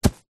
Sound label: footstep single soccer boot run grass